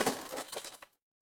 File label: impact crash